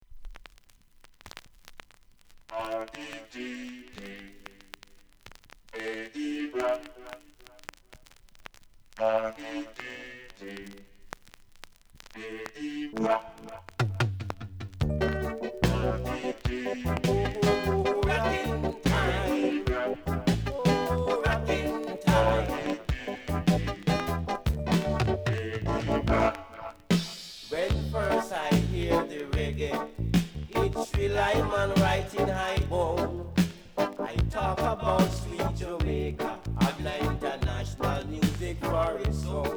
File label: REGGAE ROCK